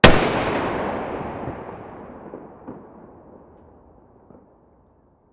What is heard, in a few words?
svd muzzle mono far